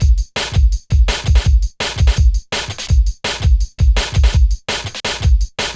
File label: Hard Stepz, Drum Loops 166.5; Zero-G Jungle Warfare 1, 2 & 3